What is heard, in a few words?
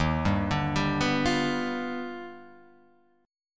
Eb Augmented Major 9th Eb down Guitar Open G